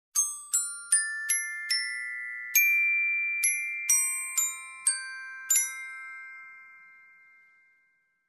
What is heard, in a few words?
Happy ending glockenspiel melody